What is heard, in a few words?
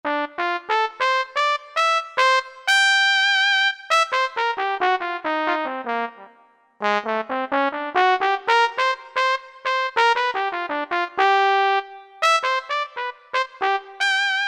Trumpet, Roland SC-50 Sound Canvas; Factory sounds